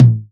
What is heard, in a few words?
Tom; Drums